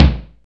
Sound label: Bassdrum, Korg T3